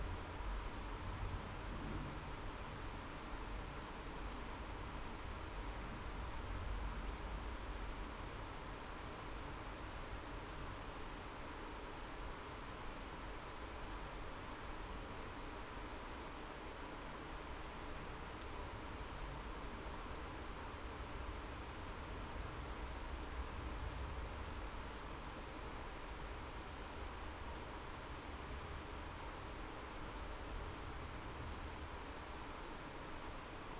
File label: no obvious biophony